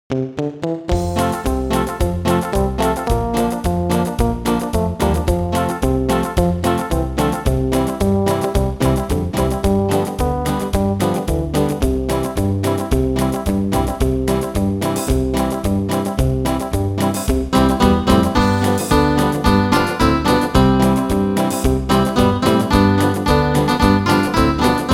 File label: midi